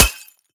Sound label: glass, bullet impacts; Half Life Model Pack